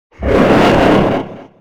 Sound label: roar; GhostDragon